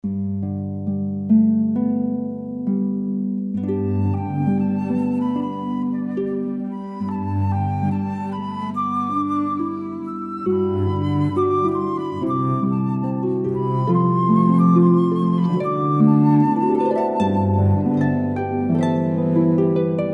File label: harp flute cello